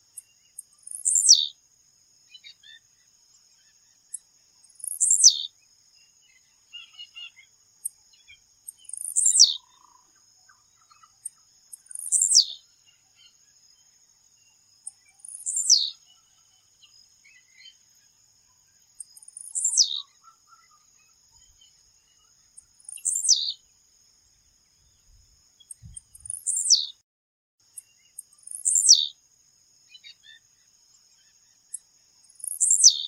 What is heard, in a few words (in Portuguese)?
Canto do Tiziu